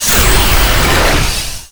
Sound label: melee; Quake 4 Alpha; strogg; valkaryne